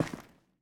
Footsteps; Hero; Blind Survivor

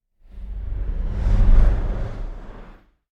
Whoosh Deep Hover Heavy Bass; Hollywood Edge; sound designer's toolkit v2

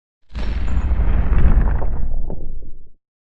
Explosion Low Fire Destruction - Short Passing; Hollywood Edge; sound designer's toolkit v2

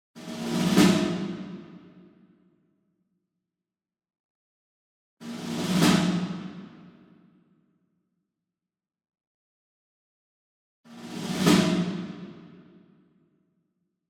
Single Snare Ludwig Black Beauty Roll Far; boom library; Cinematic Strikes Construction Kit

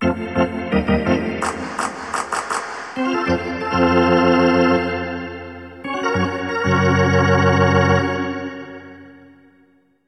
Hockey Organ

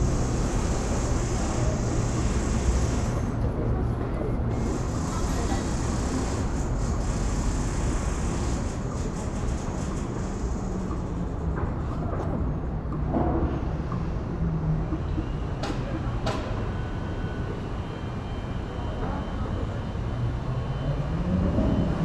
Urban day construction traffic babble; urban and suburban; boom library

